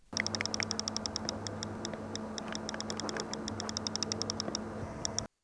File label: Lk champlain echo